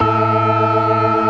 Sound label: STOPS, Synth and Organ; InVision Interactive; Keith Emerson; The Most Dangerous Synth and Organ; ALL STOPS